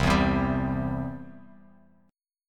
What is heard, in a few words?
C Major, Bass Standard; strummed; JGuitar